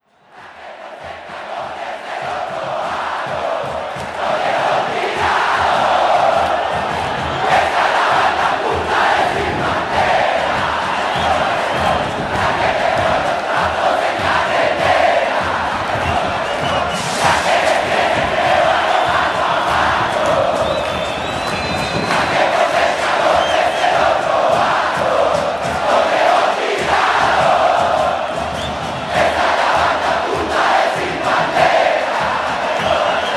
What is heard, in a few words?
crowd